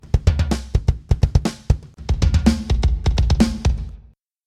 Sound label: kick Snare